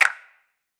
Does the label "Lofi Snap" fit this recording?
Yes